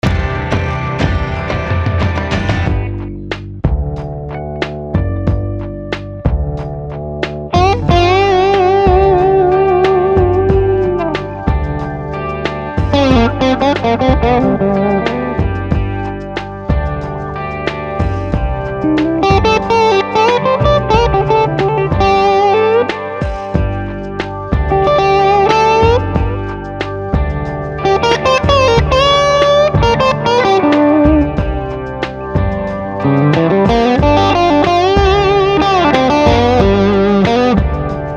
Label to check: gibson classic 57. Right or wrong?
Right